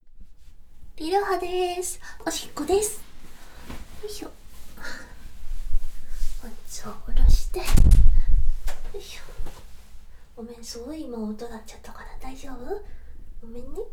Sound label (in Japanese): おしっこですッ！